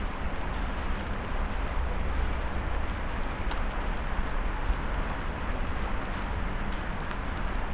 ambi; Mafie 1

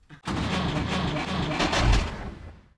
start bad; Mafie 1

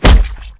body; Mafie 1

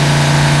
ford V8; Mafie 1

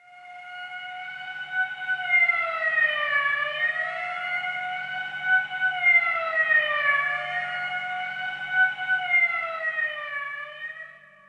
police; Mafie 1

